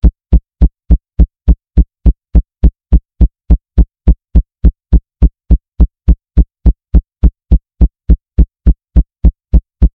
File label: clock ticking